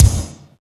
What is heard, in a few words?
CRACKED KIC, Industrial Set; Techno Trance